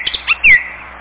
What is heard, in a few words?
birds